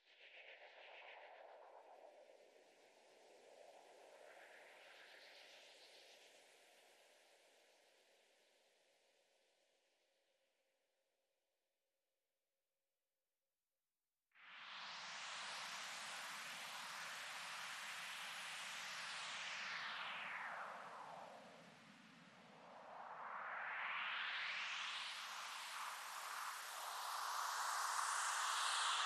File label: Noise Sweeps